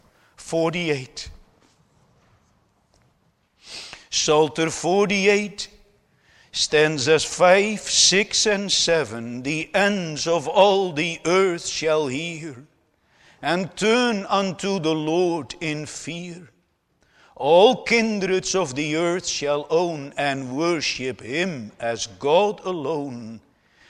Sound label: Singing; The Wise Men from the East - 2; Netherlands Reformed Congregation, St. Catharines, ON